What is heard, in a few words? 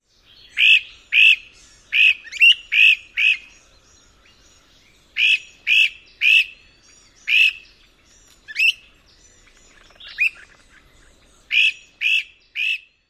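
Great Crested Flycatcher prrrt calls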